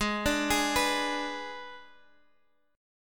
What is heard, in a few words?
G sharp Diminished, Guitar, Half Step Down